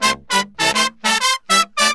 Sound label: 100 AM L, BRASS SALSA